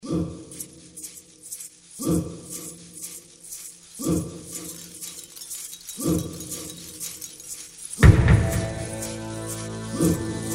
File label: Dark and aggressive, ancient and tribal. Lots of percussion, didgeridoo, grunts and chan